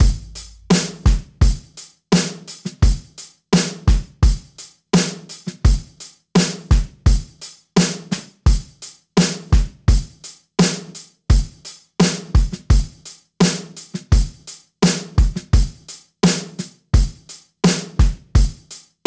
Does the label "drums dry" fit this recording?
Yes